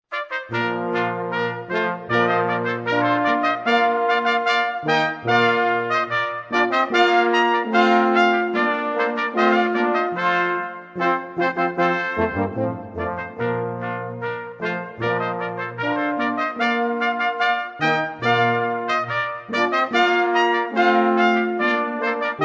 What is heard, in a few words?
brass rondeau